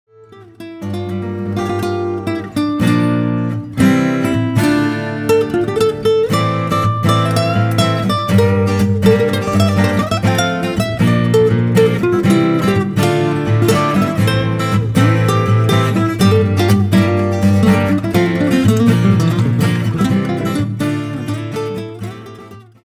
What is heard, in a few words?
stomp